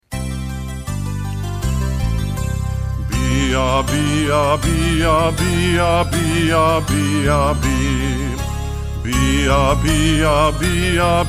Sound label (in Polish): cwiczenie bee ah mee ah zee ah nee ah